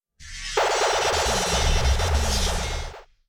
camshake step

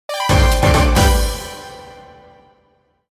Jingle Win; Puzzle & Dragons Gold

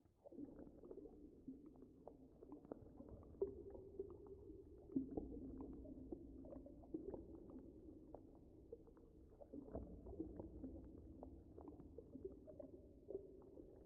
Creatures Idle

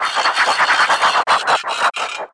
Prizes prize reveal button

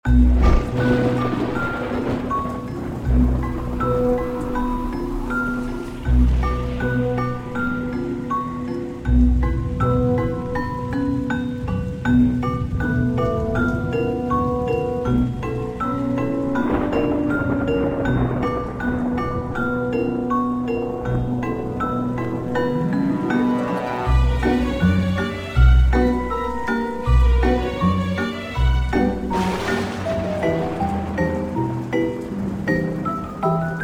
Menu Ambience; Haunted House; XBLA; Xbox 360

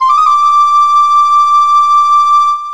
Flute; Shin Megami Tensei if...; PSX

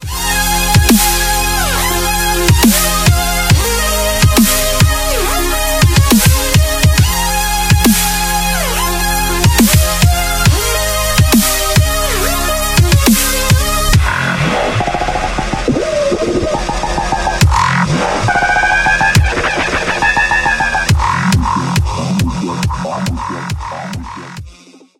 End Level; dashy square

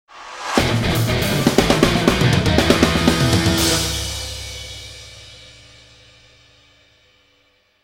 Win or Lose; Fight of Animals; Android

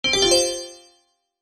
One Up Sound

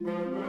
Music Dialogue Neutral Start 60bpm; Asterix Obelix Slap Them All 2

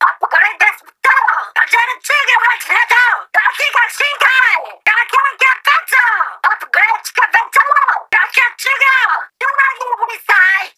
Cheers Standby; Grand Fest Tricolor

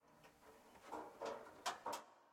Creak Med

